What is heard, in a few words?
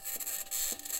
mic, mars2020 edlcam